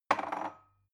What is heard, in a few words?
glass shelve wobble